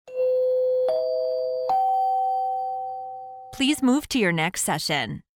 three chimes ascending please move to your next session